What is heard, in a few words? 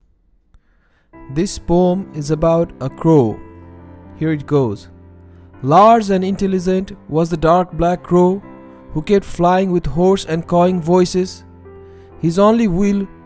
crow music